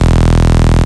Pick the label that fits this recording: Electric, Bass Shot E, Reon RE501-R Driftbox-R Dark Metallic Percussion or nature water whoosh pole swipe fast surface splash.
Bass Shot E